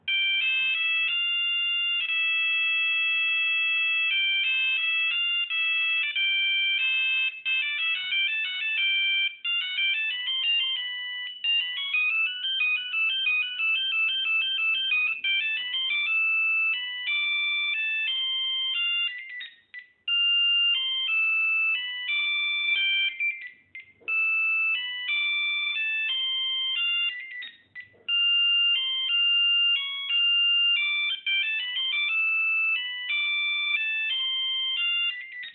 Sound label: nokia 1100 ringtone; tchaikovsky